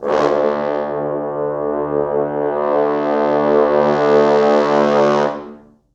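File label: 4 French Horns, Horns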